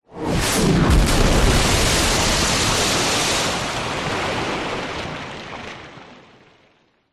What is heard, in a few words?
warfare missile hit water bomb splash large; zapsplat